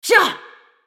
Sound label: spell cast effort; Leona